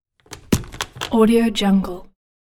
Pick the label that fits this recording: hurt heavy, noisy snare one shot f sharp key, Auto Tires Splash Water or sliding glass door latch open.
sliding glass door latch open